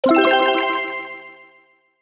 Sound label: xp Sound